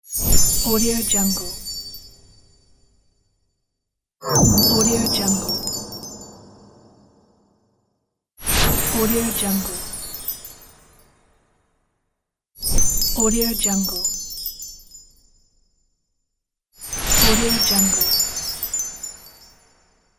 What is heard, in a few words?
magic wand